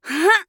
Grunt Slow; Mage; Realm Royale

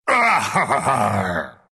laugh, ru; dota2

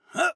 grunt attack; Paladins; Torvald

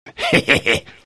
bat laugh; Dota 2; batrider

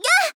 Grunt Hit; Mage

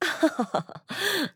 Mage Laugh; Realm Royale; Radon Flux

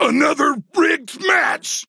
Death; Warrior

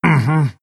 move pain; pangolin

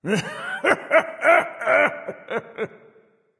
laugh; Smite; Father Time Chronos

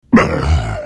pain; Pudge

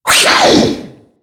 pain

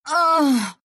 pain, ru; Dota 2

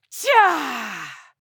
Grunt Attack; paladins; Imani

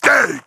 Grunt Hit; Makoa

